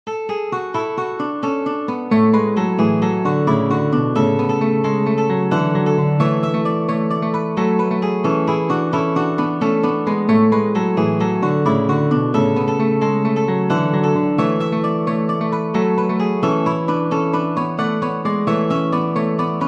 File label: prelude for guitar duet; bach johann sebastian; dulci jubilo